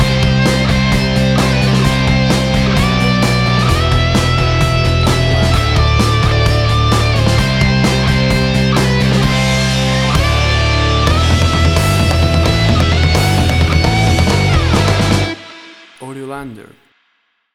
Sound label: Angry Heavy Rock Metal Music; 15 SEC; AUDIOLANDER